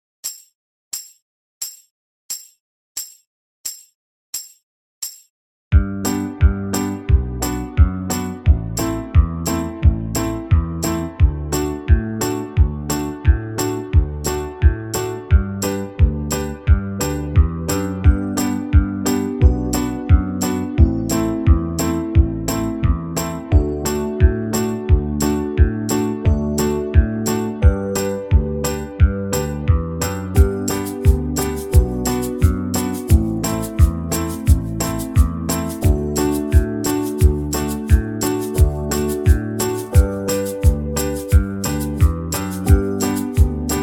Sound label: key of g slow, banjo; backing track